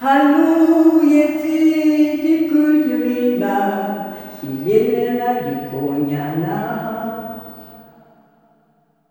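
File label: LULLABYE, SOLO FEMALE; Spectrasonics; Heart of Africa 2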